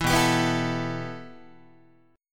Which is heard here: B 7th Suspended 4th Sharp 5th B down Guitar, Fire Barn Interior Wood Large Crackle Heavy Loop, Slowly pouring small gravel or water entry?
B 7th Suspended 4th Sharp 5th B down Guitar